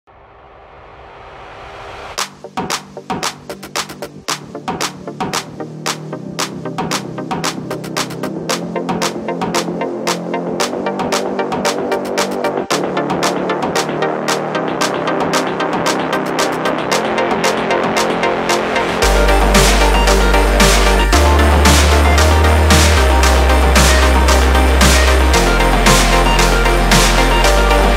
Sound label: Glitch Hop 110BPM